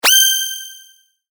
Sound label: sword